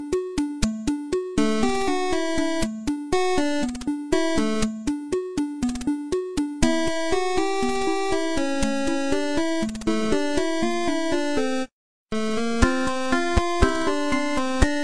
8 Bit; Portal

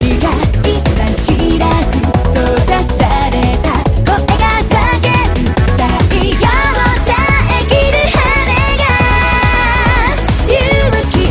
Techno

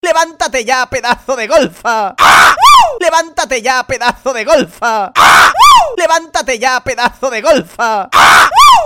Ringtone

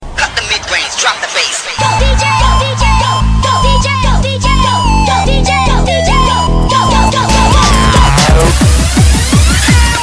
Missed Beat

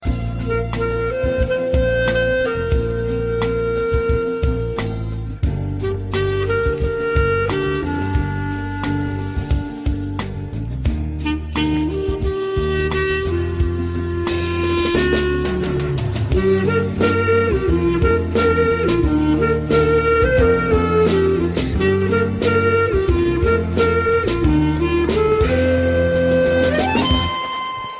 Train Tune

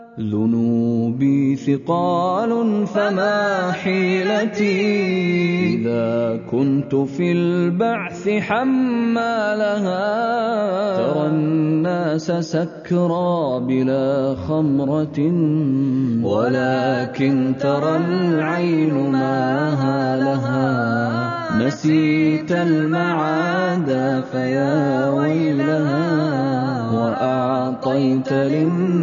islamic

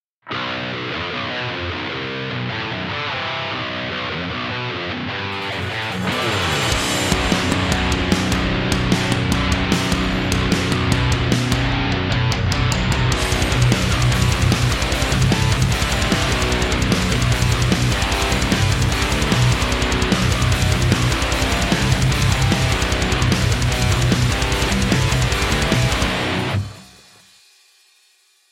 Heavy guitar intro

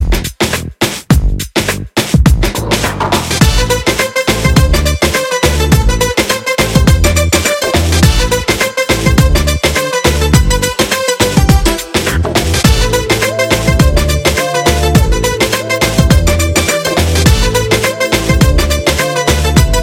Kutty Music

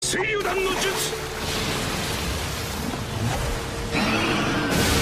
Water dragon missle

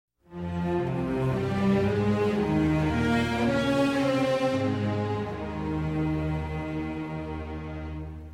adagio

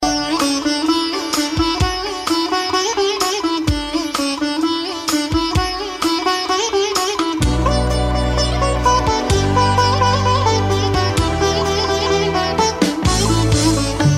Romantic Love

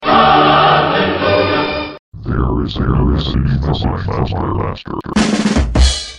BURP

